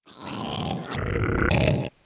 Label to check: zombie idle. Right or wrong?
Right